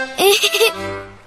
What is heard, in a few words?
giggle